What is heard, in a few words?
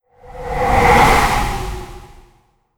Frozen Rose; Enchant